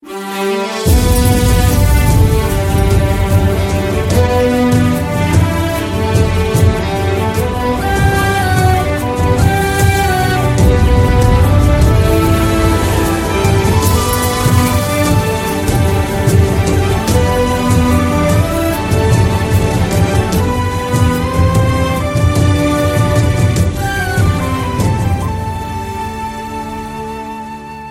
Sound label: music ringtone